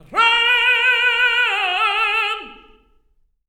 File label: TEN. TRAD; Spectrasonics; Symphony of Voices